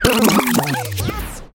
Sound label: Turntable Scratching Backspin